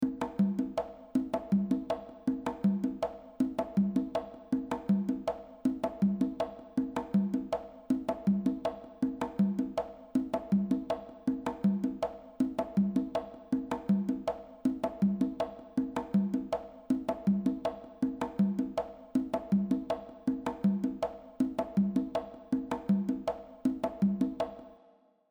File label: reverb loop Room; ChromaVerb